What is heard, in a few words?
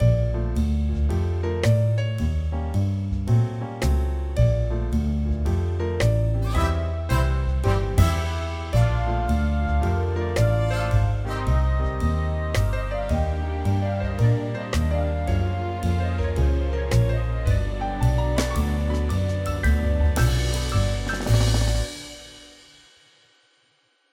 Swing Main Melody